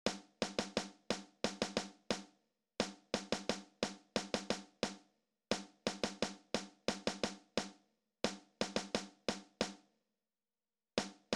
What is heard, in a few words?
Go Warm Up, drums